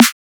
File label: drum snare